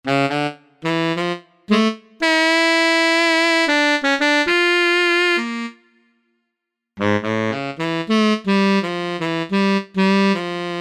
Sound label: T-Sax, E-mu Proteus VX